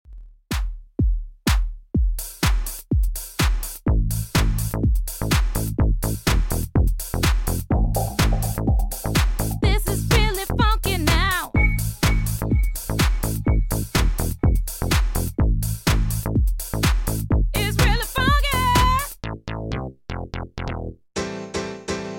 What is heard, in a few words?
House